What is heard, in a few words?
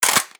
bolt back; Rust